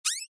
cartoon squeak high pitched from mouth; zapsplat

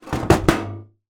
leisure game rummy o tin down on surface; zapsplat

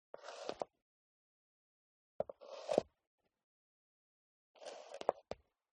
FOLEY STEP High Heels Concrete Scuff; smartsound

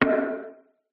cartoon knock single wood vintage effect

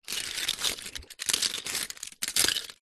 leisure toy cars pile on carpet rummage movements; hotwheels

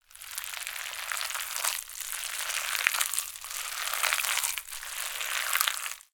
horror flesh guts movement; sound effects one

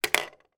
toy small plastic toy put down on table

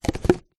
drink empty take away coffee cup plastic lid on; food

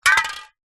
industrial can wd40 drop fall over floor

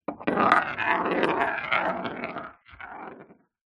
money coin roll on wooden floor

